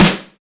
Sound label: Short Snare Drum Sample G Key, steel snare drum sound tuned to the G note; snare drum samples